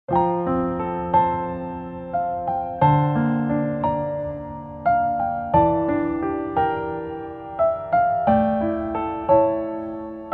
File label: Piano